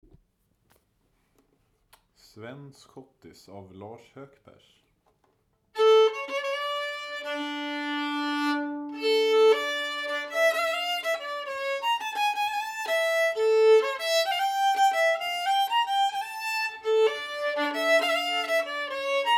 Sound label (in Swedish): schottis; Svens